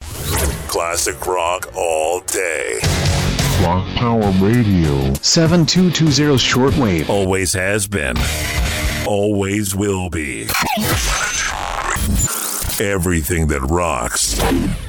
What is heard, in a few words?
jingle, Rock Power Radio